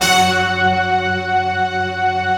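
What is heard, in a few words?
staccato orchestra, F#4; Best Service; Advanced Media Trax Modern Composer; STACCORCH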